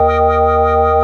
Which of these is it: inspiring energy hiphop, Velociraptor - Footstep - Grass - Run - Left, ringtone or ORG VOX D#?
ORG VOX D#